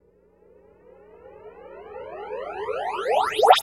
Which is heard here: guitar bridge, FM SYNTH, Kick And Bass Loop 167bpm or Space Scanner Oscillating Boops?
Space Scanner Oscillating Boops